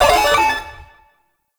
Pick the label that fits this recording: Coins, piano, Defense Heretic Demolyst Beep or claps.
Defense Heretic Demolyst Beep